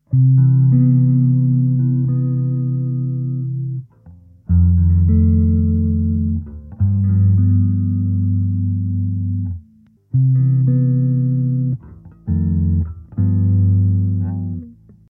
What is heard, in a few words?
Chorus FX